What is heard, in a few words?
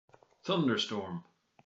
thunderstorm